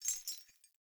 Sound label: Grunt Foley Movement Chains